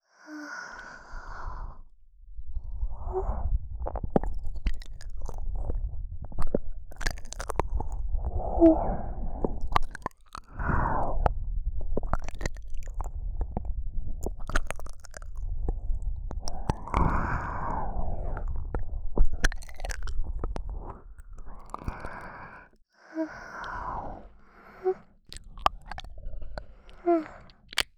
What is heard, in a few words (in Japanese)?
唇でお耳を包み舐め, ASMR; 【耳舐め超絶特化】吐息たっぷり…とろとろねっとり快感耳舐め。～おみみ、いっぱい気持ちよくなっちゃおうね…♪～